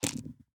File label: DICE on Felt, Throw and Roll, Standard, Sixteen Dice; Samples; ArticulatedSounds; DICE Sfx Party (Extended)